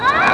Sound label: scream